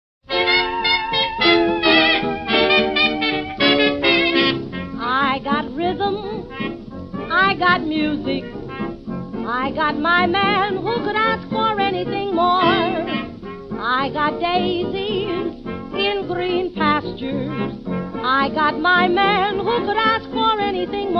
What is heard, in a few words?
rythm, ragtime; le ragtime